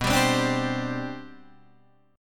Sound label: C Suspended 2nd, B, guitar chord; C2/B strummed